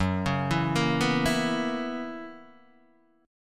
Gb 11th, Guitar, Open D

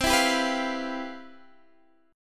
C Major 11th C, Guitar Standard; CM11 strummed; JGuitar

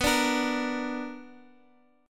B Minor Major 7th, Guitar, Drop D

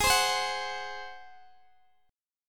Ab 7th Ab down Guitar Open D

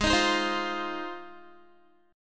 G sharp 6th G sharp down Guitar, chord; G#6; strummed

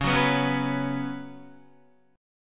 Db 7th Suspended 4th Db down Guitar; strummed

